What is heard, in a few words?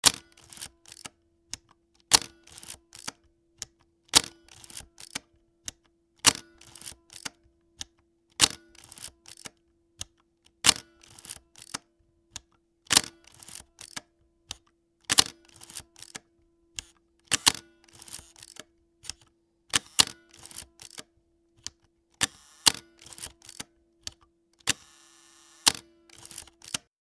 VIVITAR 2000